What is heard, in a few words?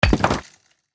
axe mining stone; Factorio; Paranoidal mod; immersive sounds